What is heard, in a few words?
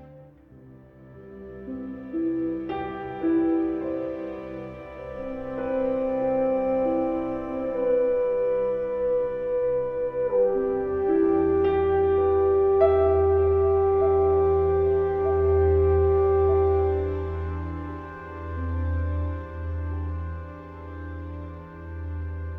Office Loop; Aviary Attorney Definitive Edition